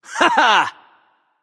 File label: yay; smite; arthur